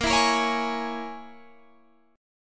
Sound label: E 6th Flat 5th Bb